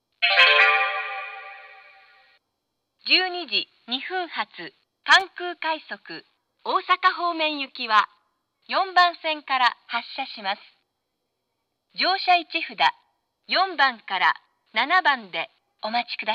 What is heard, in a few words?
rapid; jr-west; kansai-airport; kansai-airport-rapid; for-osaka